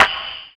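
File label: snare drum; 90 s Sample CDs; Sound & Vision - Gigapack I CD 1 (Roland); Dance-Kit 25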